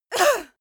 female moan pain short soft; koerpergeraeusche; menschen; soundpool

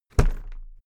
briefcase plastic drop soft

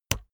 keyboard laptop enter push hard

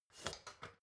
step ladder metal scrape single

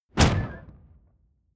wobble flute; whooshes rises

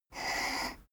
poker chip slide table short

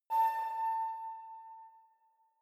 sci-fi facility ai awake damaged light, signale; soundpool

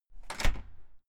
entrance door close slam outside

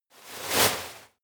step walk graze grass; schritte

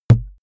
impact tub short; soundpool

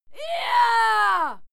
female cheer yah; schreie; menschen

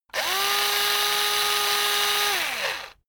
electric drill screw out long; soundpool

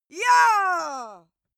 female cheer

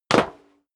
step sneaker metal dry jump; schritte

